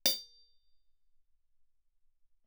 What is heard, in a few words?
hihat, bell, ff; Percussion; cymbals